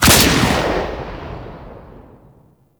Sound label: Cannon Secondary Shot; Grineer